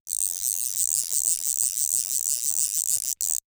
Sports Fly Fishing Reel Backwards Quick

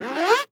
Household Zips Plastic Zips Quick

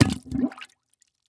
Impact Plunger Plunging Into Water Gurgling; Impacts

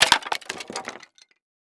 Crash Rock Drop On Wood Debris; crashes; AdobeCC

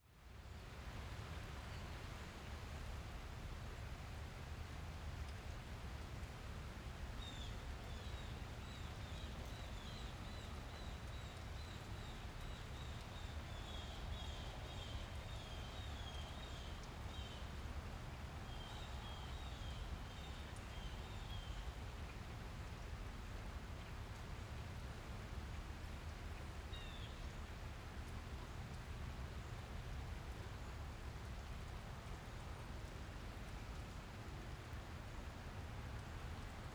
Ambience Rain And Birds; AdobeCC

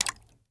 Impact Plastic Bottle Cap Dropping On Water

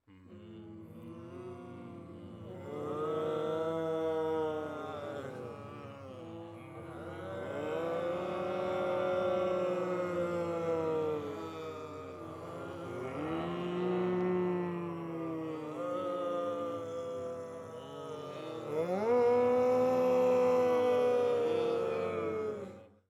Horror Scary Crowd Zombies

